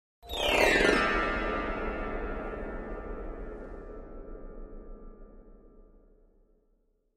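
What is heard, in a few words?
Grand Piano Strings High; keyboards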